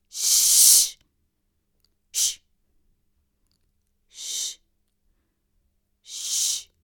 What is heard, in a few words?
Sshh